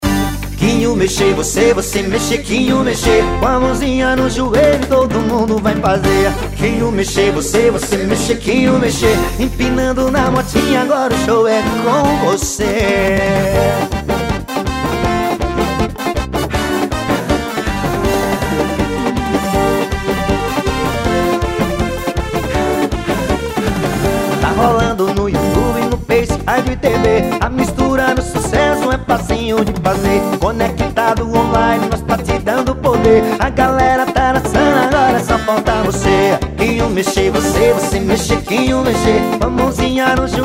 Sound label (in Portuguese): sertanejo